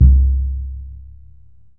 Surdo

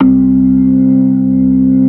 C2, Keyboards Old School; USB Soundscan vol.09; AKAI; SMALL B3

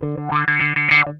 OUCH, Funk Guitar; Zero G - Funk Guitar

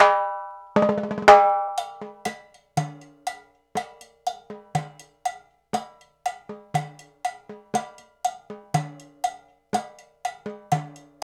120 CHA, Cha Cha Cha, African Contemporary; Spectrasonics; Supreme Beats

